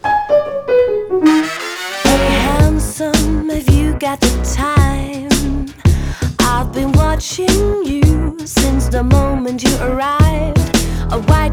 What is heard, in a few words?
Song; Lewd SFX